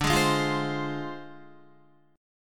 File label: D Minor 7th Flat 5th Guitar; Dm7b5 strummed